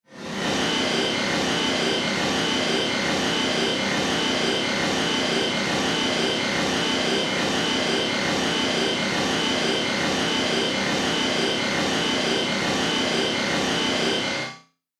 Motor Industrial Mechanical SciFi Pulsing, Metallic Throbbing; Sci-Fi (Sound Effects:Science Fiction)